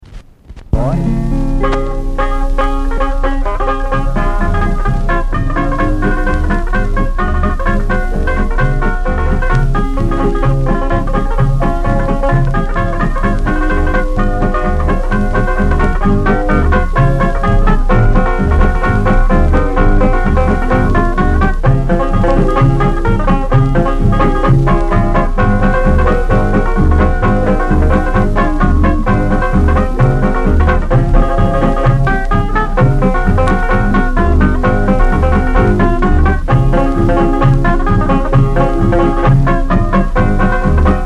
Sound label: tenor banjo